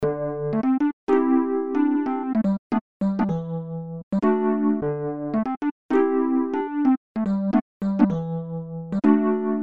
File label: e piano loops